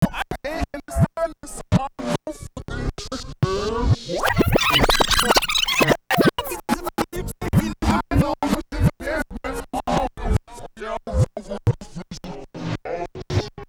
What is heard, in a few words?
long scratch, Vinyl Scratches